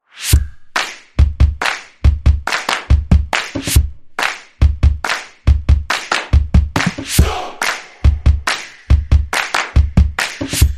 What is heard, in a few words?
Happy stomp claps; Bootflare